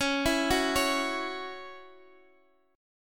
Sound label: C sharp Minor Double Flat 5th C sharp Guitar Open D